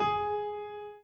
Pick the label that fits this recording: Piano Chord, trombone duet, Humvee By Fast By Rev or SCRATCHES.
Piano Chord